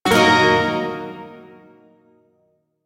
Shawzin Blues Chord; G; Warframe; Duviri Shawzin

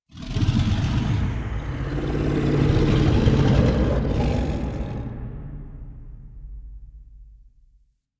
Idle Agile Pistol; Warframe; Voruna; Idles Agile

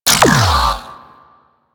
Sentient Laser Beam Fire; Warframe; DE; Sentients; Sentient Trooper

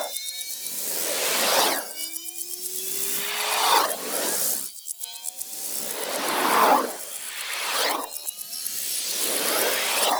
Anchor Rewind Loop; Warframe; Protea

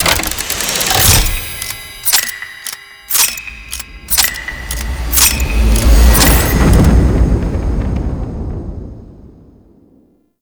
War Clock Start; Tau; Twelve Minute War; Objectives Meta

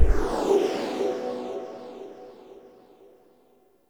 Stinger; Leverian Dante; Warframe; Vitruvian Museum

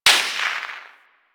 Bullet Whizzby; Warframe; Weapons

